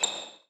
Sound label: Sneaker Squeak Regular Court